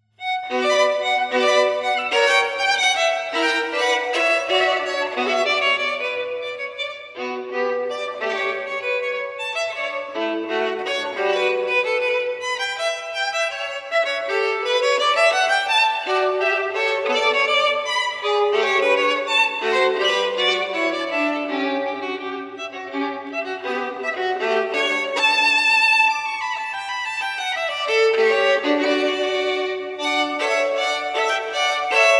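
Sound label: partita, Bourrée; Bah partita 1 Burre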